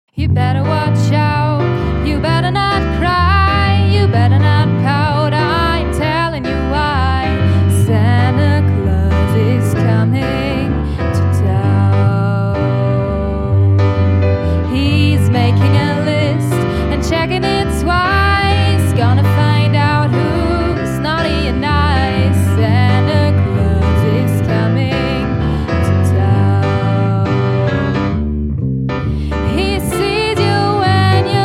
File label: Santa Claus Musik